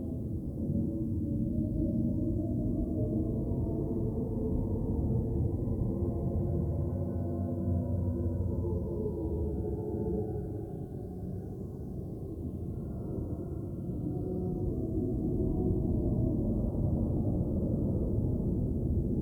music - mystery - theatre ambient low short loop; You Are Empty

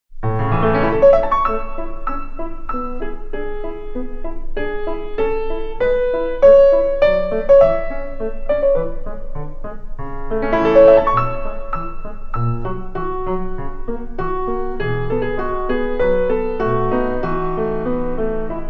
piano sonata; Mizu no Onna

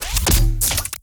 Grenade Launcher Fire Heavy; Robobeat

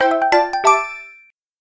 Slide Puzzle Completed

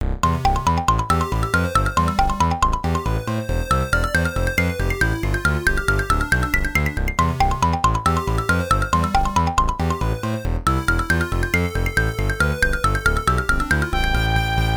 Conversation Screen; Densetsu no Starfy 2; GBA

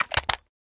Pickup Grenade

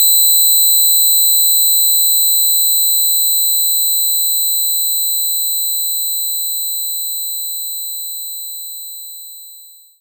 Pick Bass C6; knights try

